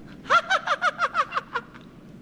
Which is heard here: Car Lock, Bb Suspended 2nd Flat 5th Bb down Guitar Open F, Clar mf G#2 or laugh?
laugh